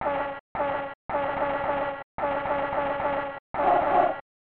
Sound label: Crowd Chanting; kick off 3 1994 snes